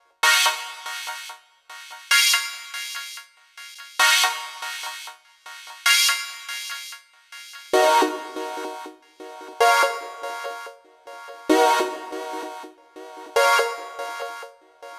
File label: Pad Chords, EDM; Sonic Foundry (Sony Creative Software); Afterhours EDM; Retro Fit